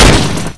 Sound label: m249 fire